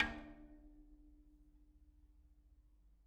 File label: Bicycle Spoke